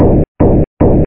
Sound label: blop blp blp; TacOps Demo